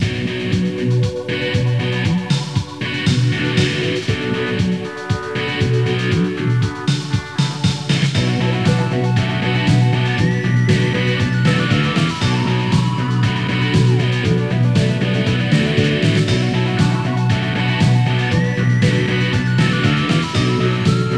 Rock Style; RCT

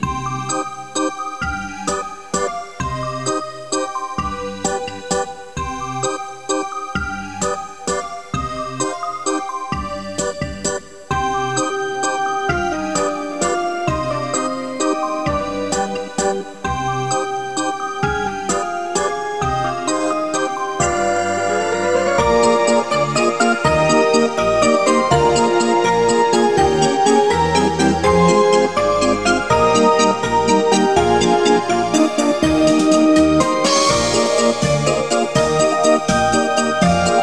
Extended RCT Theme Music; 1:28 min.; The Definitive RCT Reference Guide; atmospheric CSS sound files which cannot be player selected as Ride Music; Note that originally this theme music lasted only 1:03 min.

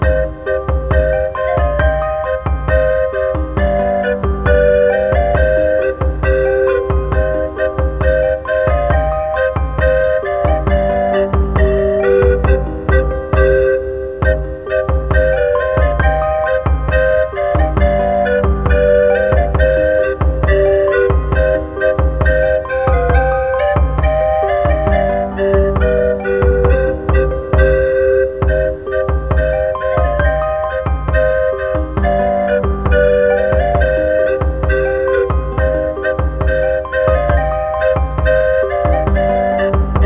Medieval Style; 1:25 min.; RCT

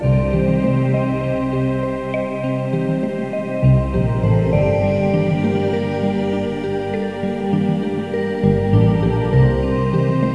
Gentle Style; RCT

